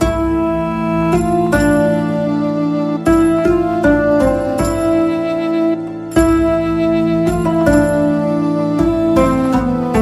keys, Loops, Sad

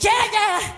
"yeah yeah" SUNG; ooh ahh

